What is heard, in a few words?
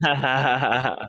Laugh